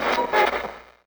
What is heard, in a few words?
Jukebox Switch; Zomboid; Lifestyle